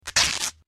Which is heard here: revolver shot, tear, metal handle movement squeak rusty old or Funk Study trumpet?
tear